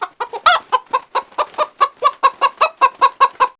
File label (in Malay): ayam